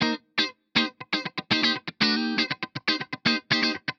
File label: funk guitar dry; modular effect board; sonic instruments